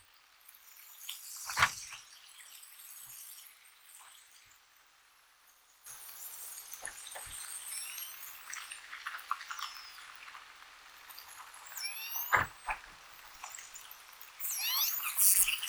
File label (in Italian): Vocalizzi; Orche Genova